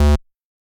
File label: bass